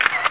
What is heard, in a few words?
camera click; PUZMANIA